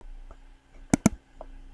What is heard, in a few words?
Computer; flashkit; Carson